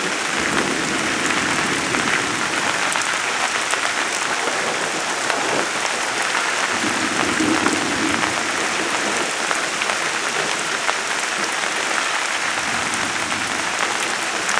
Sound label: rain, ambience; XDM